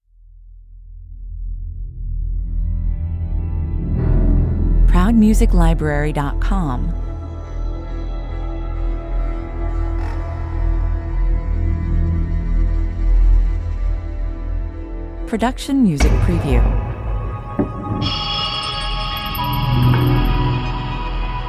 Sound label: 120 bpm